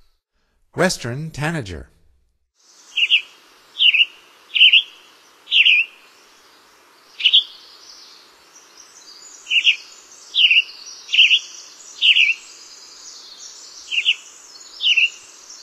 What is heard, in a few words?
Western Tanager, Songs of Birds of North America; Stokes